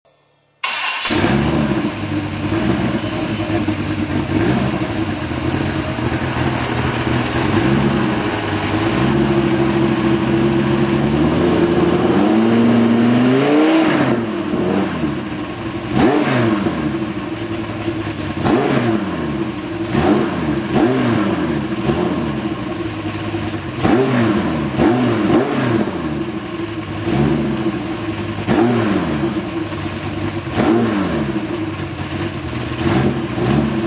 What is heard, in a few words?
viper sound, car